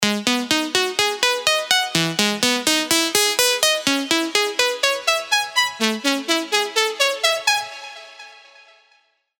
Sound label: Serum arps; Hidden Track